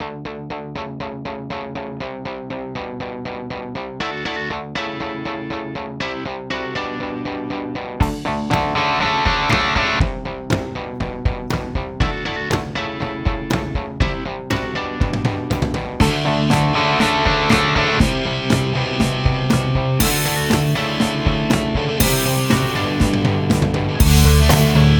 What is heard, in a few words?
Guitar Dance